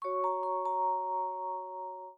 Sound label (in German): Kuckuck Dur